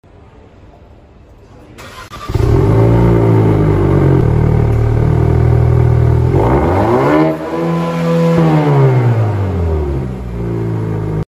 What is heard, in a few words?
Changan, اگزوز